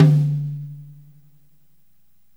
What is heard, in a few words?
S HITM, DRY KIT; Spectrasonics; Burning Grooves